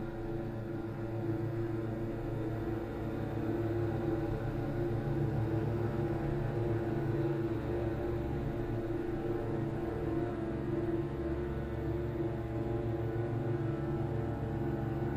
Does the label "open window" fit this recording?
No